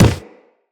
Run Wood, footsteps; Operator

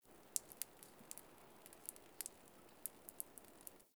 Exterior Water Drip; Warframe; Ambience; NineteenNinetyNine

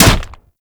Staff Impact Flesh; Warframe; Weapons; Impacts

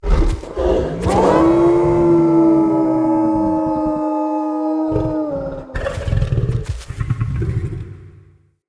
Quest Cub Taken Howl, Wolf; Animals; Orengall

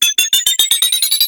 Suicide Scuttler Pre Death Beep; Enemies; Gameplay; Boss; Warframe; Oraxia

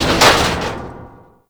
Military Checkpoint Metal Hit

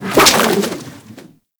Pirate Kraken Tentacle Whoosh; Warframe

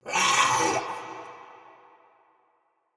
pain, soldier; Barks Battle; Mendicant

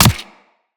Player Bullet Impact Flesh; Weapons; Bullet Impacts

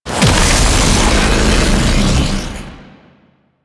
Lightning Stomp Land; Warframe; The New War; Archon; Boreal; Abilities

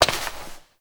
Step Rock; Warframe; Koumei

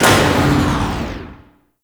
Large Elevator Stop; Doors

